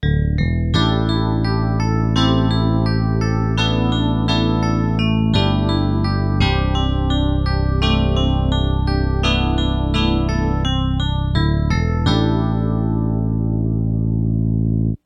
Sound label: ep bass stereo clone theory